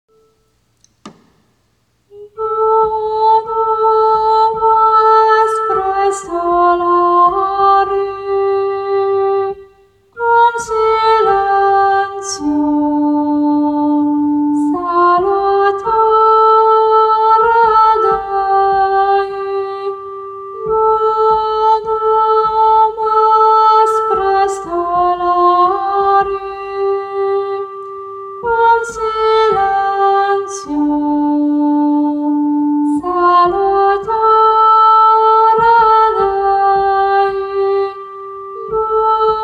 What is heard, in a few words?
sopran; Bonum est praestolari